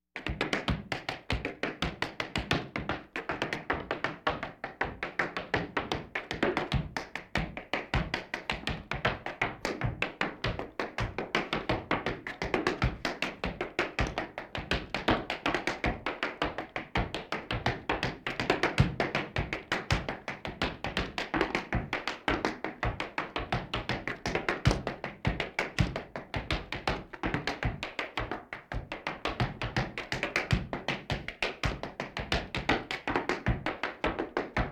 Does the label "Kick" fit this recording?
No